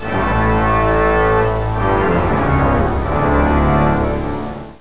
toccata; vamp

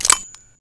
pin pull; weapons

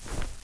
grenade throw; weapons

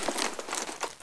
rpg draw; weapons

